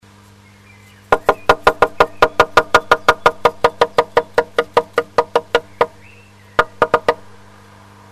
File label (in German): meise klopft, nistkasten